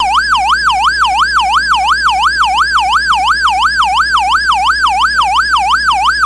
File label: Yelp